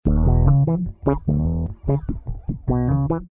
E 149 Wah Funk

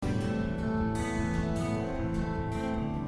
Picking C 78

